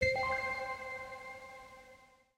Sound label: spin music click; spinsane